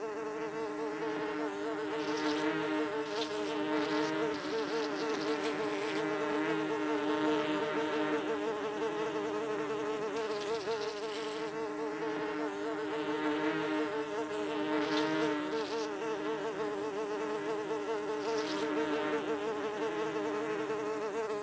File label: fly buzz; Star Wars Knights Of The Old Republic I; XBOX